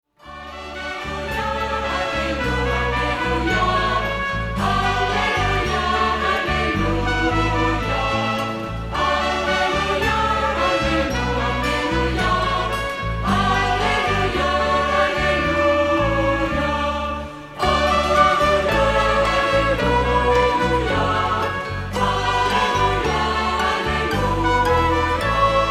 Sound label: Alleluia de Taize